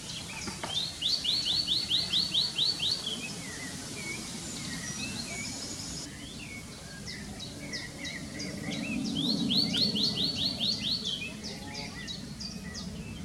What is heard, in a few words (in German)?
moenchsgrasmuecke